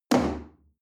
chair wood place hard

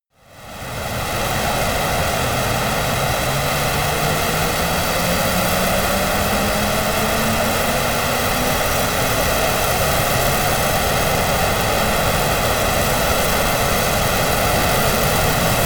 military jet rafale turbine full rev up

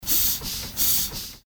steam engine valve

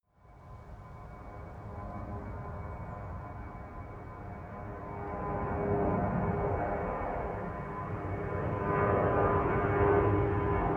propeller plane incoming land

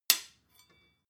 sword hit clack

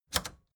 record player power click on fast

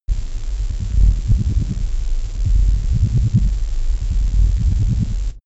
space alien machinery steady calm pumping

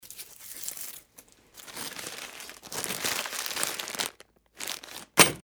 paper noise

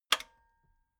metal button radio spring low

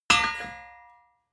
hammer hit steel clank ring

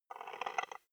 wood board scratch

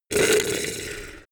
beast choke deadly short

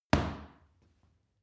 paw kick medium

